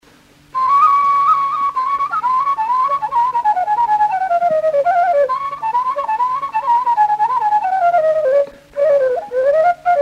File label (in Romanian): Hora lui Maricel Budala la fluier; jocuri si cantece batranesti; Vaslui